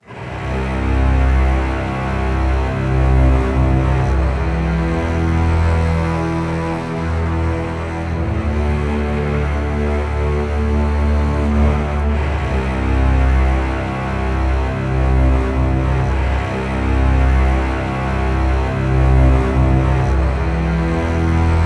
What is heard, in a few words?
Basses - C 120BPM; Construction Kits; STEMS; Ghosthack; SkyRealm; Kit 11 - Warriors Cry